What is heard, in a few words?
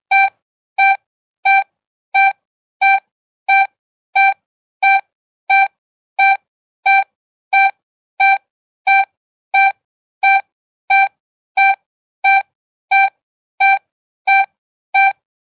bpm89